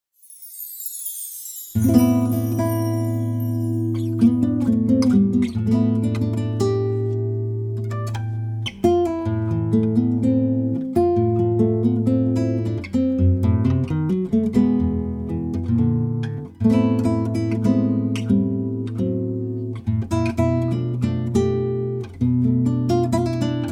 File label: guitar solo